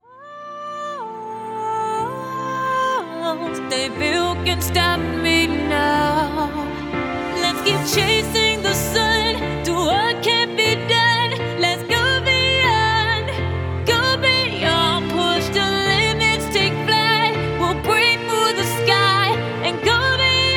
Female, ringtones; Honor; 600 Pro China

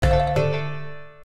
Chinese slide close; Nokia; audio themes

